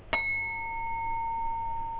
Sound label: glass